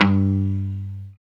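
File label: guitar mute; 90's Sample CDs; Club-50 - Foundations Roland; Funk & Mute; Chunk Mute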